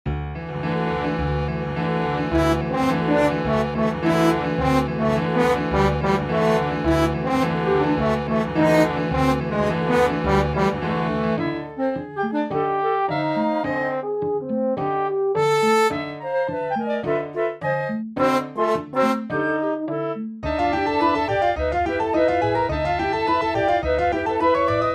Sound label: danza del tambor; caturla; drei stuecke